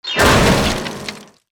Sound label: Piano Drop; weapons; aronwk collection; ndaudio